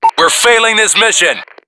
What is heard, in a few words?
lose; payday